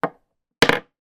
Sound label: foley battery aa down on table then fall; zapsplat